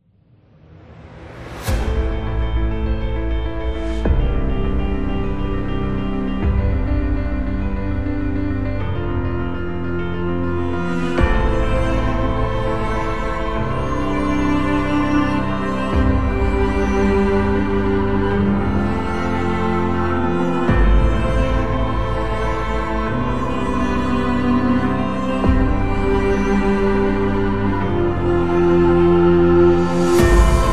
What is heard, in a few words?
Trailer Action, epic music; epic music vol 1